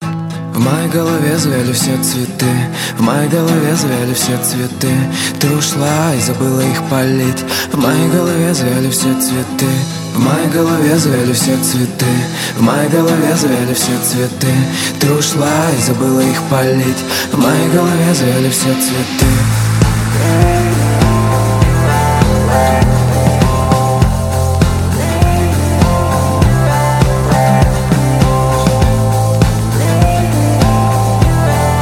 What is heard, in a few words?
hip hop